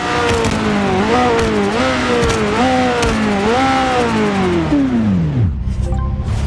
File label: slowdown highspeed, ferrari f40; vehicles